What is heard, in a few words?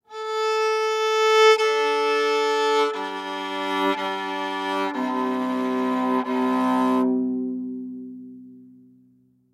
Viola Tune; Strings; Blastwave